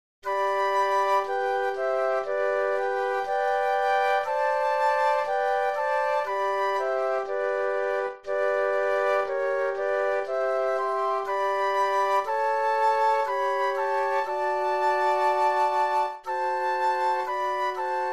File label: Flute Trio